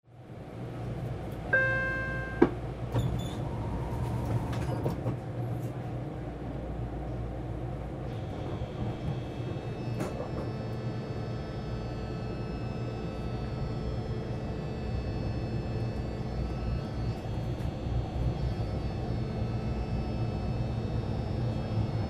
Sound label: subway train ride